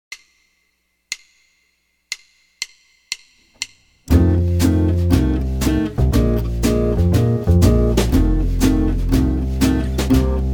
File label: Jazz Blues F Major Root 6, jazz guitar